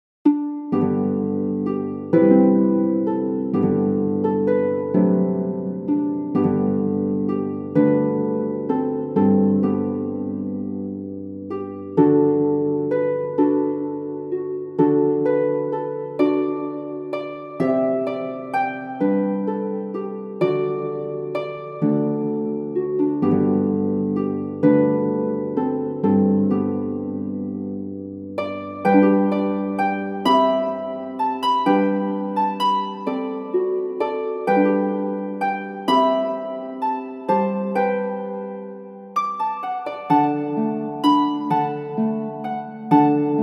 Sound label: Harp; Adam Ondi Ahman; Holyoak